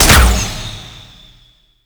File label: Short Bow Fire; Warframe; Duviri; Dax; Weapons